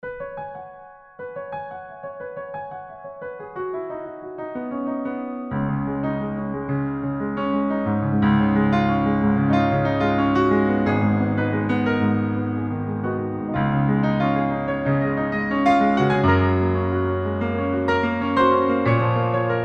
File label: Improv, pianos